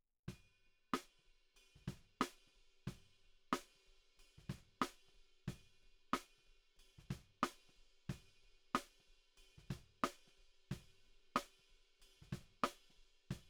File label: SNR B